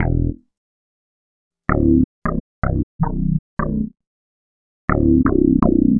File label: Hard Latin Bass; Sonic Foundry (Sony Creative Software); Knutrix Drum & Bass